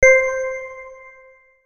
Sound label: Bells One, notifications; Alcatel Pixi 3